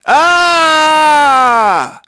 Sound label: FALL; SOLOMON; Pulse Racer